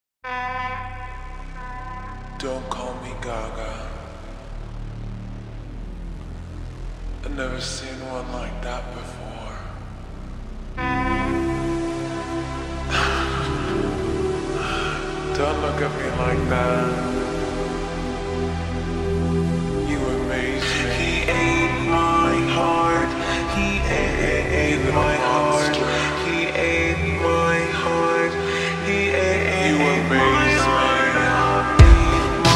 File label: slowed and reverb